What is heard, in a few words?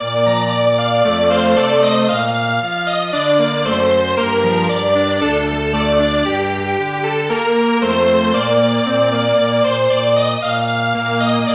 Strings Quartet; Ukraine has not yet perished